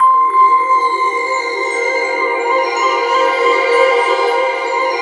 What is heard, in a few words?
SYN, Roland, digital